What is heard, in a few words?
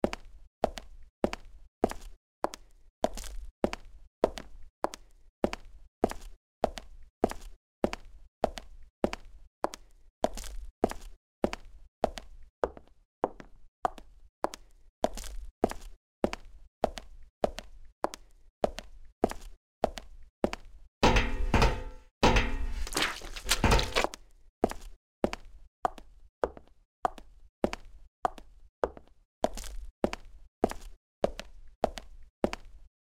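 footsteps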